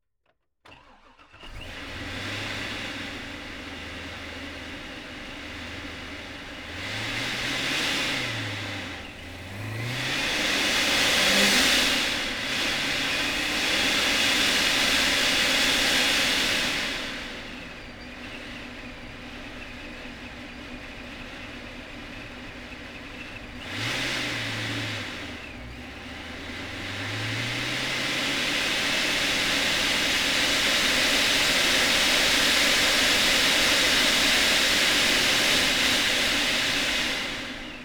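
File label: Corvette C6 Onboard Start Medium Drive Stop Reverse Stop Drive Stop Off Engine; Z06 2009; Pole Position; PZM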